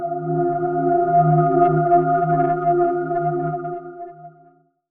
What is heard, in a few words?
PAD 4 - C; 99Sounds; Monosounds; Music Loops; Instrument Oneshots